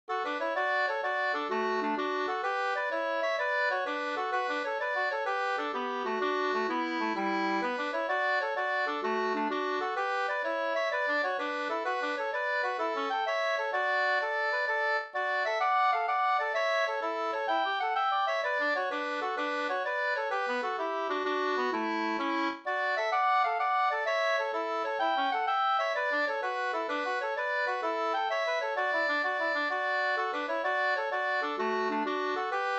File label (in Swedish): folkdans, Tretur no Ddur; Strandvik